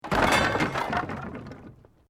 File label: Wood Collapse